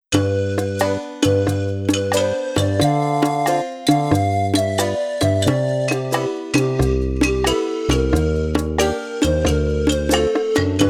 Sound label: Thunder Drum; AliceSoft Sound Album Vol 07 – Galzoo Island